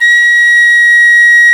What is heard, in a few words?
PICC; Roland - LA Composer vol. 1; WOODWINDS